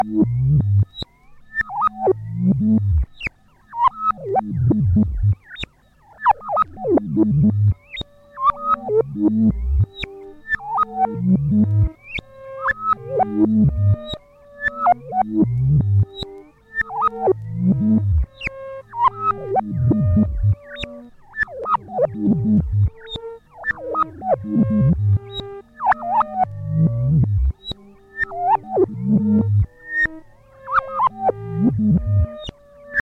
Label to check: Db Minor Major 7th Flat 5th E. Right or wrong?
Wrong